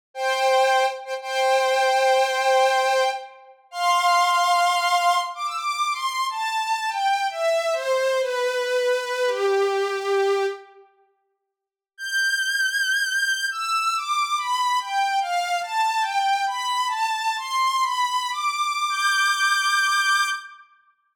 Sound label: Violin, Jupiter8; OPXPROII; opxpro2